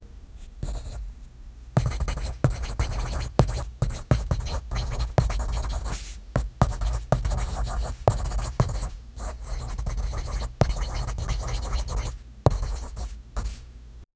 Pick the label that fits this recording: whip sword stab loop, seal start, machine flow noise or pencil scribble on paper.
pencil scribble on paper